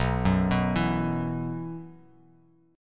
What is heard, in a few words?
B Suspended 4th B down, chord; Bsus4 arpeggiated; JGuitar